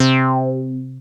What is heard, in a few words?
Bass Synths hits and octaves